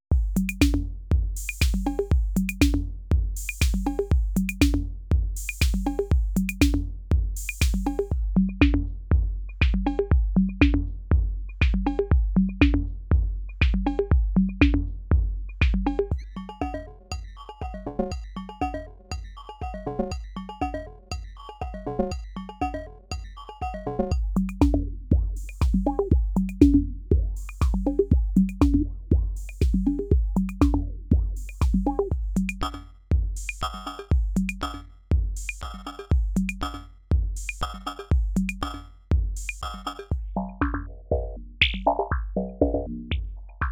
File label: FILTER MINI Drumloop; ARTURIA